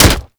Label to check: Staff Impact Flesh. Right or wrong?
Right